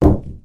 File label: metal grenade impact soft; physics; Garry's Mod; hl2